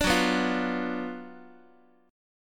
F sharp Major 7th Suspended 4th Sharp 5th, F sharp, down, Guitar; F#M7sus4#5 strummed